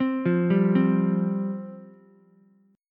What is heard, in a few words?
F Suspended 2nd F Ukulele; arpeggiated; JGuitar